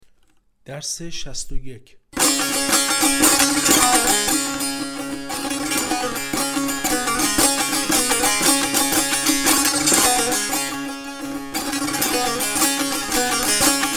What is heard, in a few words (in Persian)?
مشق تنبور